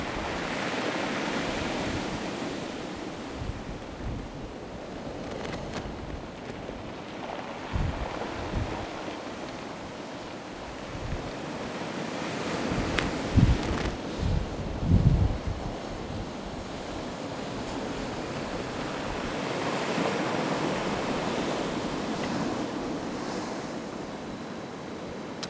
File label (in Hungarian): nadastakaras amogul ernyohuzatat lobogtato szel